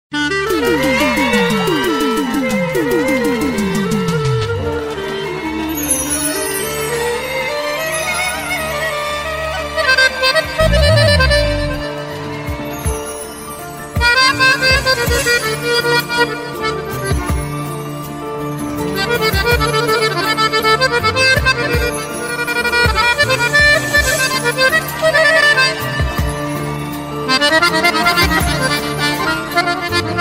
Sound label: Accordion